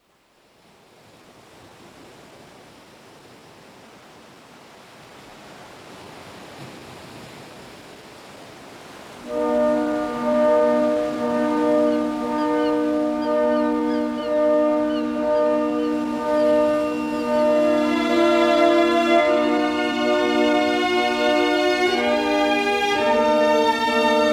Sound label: Orchestral Intro